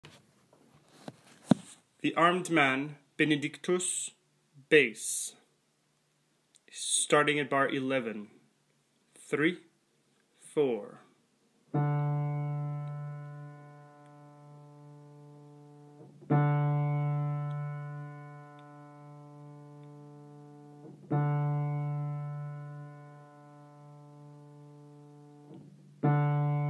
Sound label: Bass Piano